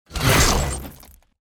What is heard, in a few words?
impact, sword; bane